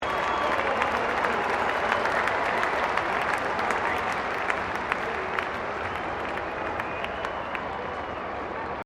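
crowd 20K Melbourne Cricket Ground cheer low, Cricket at Melbourne Cricket Ground; Crowds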